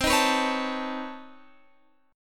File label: B 9th C down Guitar Standard; B9/C strummed; JGuitar